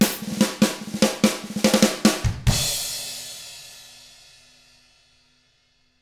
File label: rock 6/8, drums; ILIO; Double Platinum Drums 1